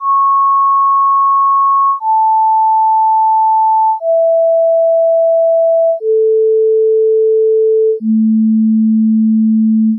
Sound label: partials single